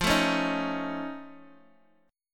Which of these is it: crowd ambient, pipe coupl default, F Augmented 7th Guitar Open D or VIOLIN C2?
F Augmented 7th Guitar Open D